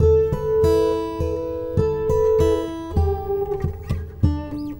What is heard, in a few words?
Red Trees Gtr, Guitar Only; Sonic Foundry (Sony Creative Software); Friendly Fire